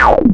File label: Wrong Answer; World Masters Pro